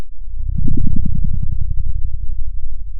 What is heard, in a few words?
pop, Sound of a dinosaur